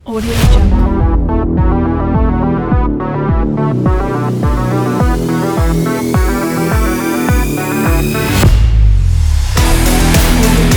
futuristic sport electro music; attractionofmusic

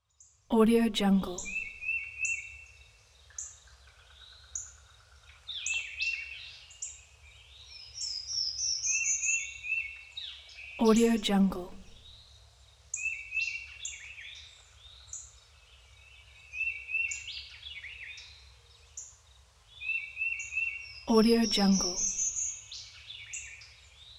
bird song

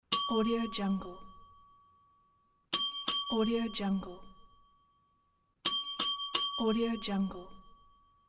bell ding ding; medusamusiclab

